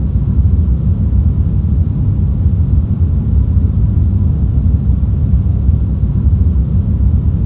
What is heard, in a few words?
RUMBLE, Aircraft A320